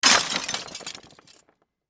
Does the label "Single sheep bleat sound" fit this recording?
No